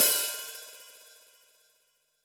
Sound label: Hat Finish